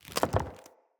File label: Mockery Foley Movement, enemy; Mockeries; Characters